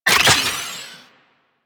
Data Mass Insert; Venus Landscape; Warframe; Ambience; Venus Open World

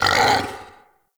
Battle Dog Pain; Animals; Vocals

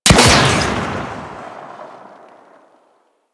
Torpedo Pistol Shot 3D, weapons; Grn Torpedo Pistol

